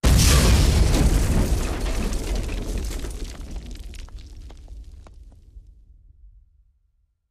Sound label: Explosion Rock Debr, rock & sand; Rock Debris 04